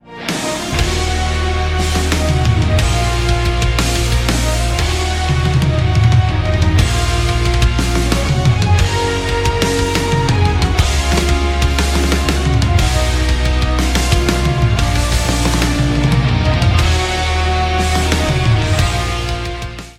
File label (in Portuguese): IMPACTO; pack de tilhas e efeitos 2.0